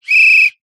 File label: sports whistle soccer burst